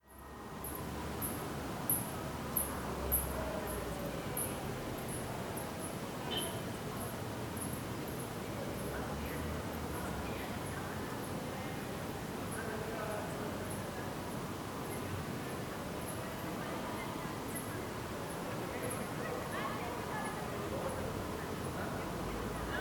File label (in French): Cloche